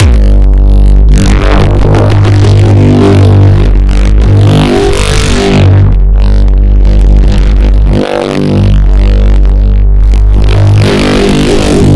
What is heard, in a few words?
Waaaaay too much processing, Neuro Bass; GranularSumo Neuro Bass Pack 01